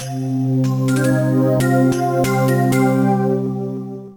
level complete; Shanghai

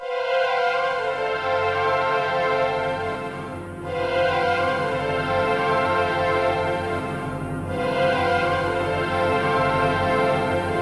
suspense, Trackers; Modland

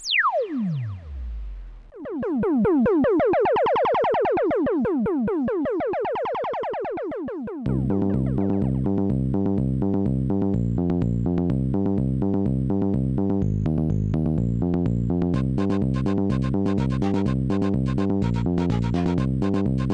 laser beams iii; MusicLine

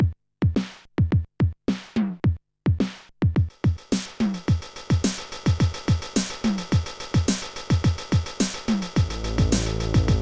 the drum song; MusicLine

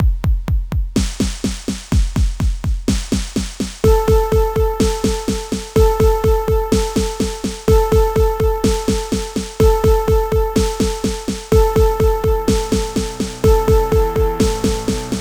red alert; MusicLine

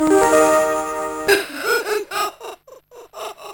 game over jingle; Trackers; Modland; Mel O'Dee

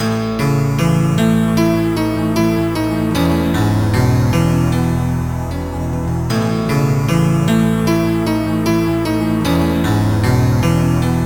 piano tune; MusicLine

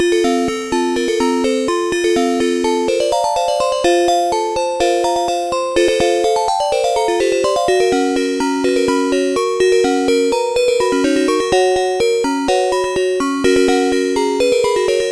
mod chime; GINSENG

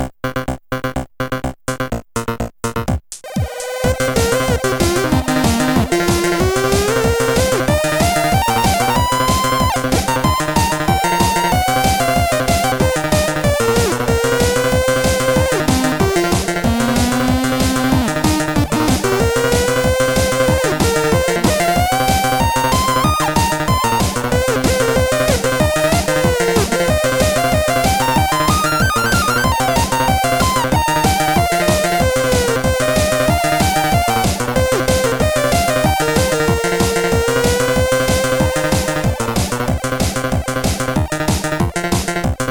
chiptune

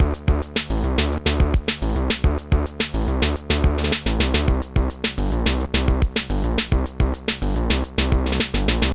funk beat; Trackers; Modland

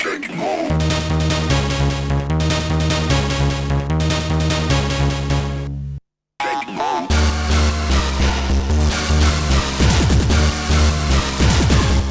tekkno reggae

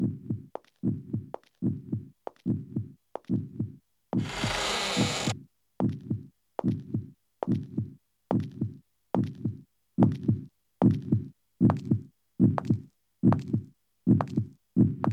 Sound Track; Sequencer One

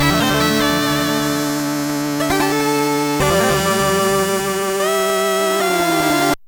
synth effects; Trackers; Modland

